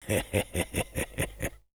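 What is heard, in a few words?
laugh evil, French; Engineer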